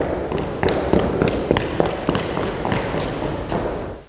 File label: RUN